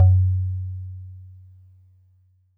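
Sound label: Warble Celeste DX100 F#2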